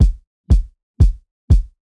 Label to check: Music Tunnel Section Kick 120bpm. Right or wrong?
Right